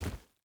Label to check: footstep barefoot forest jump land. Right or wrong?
Right